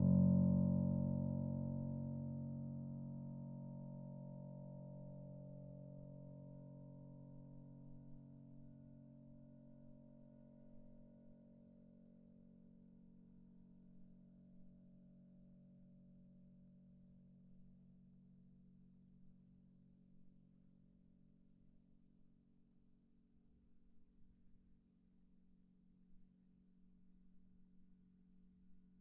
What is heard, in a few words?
F#1, bitKlavier Grand Binaural